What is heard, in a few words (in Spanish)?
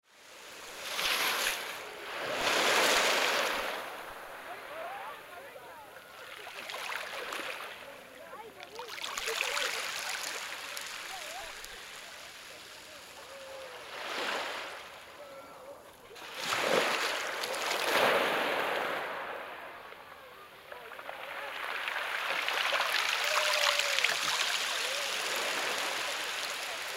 PLAYA CANTOLAO LA PUNTA ORILLA CON GENTE DE FONDO Y MAS OLAS